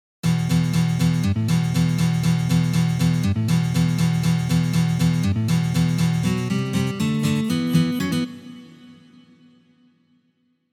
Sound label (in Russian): Uprazhnenie slajd na gitare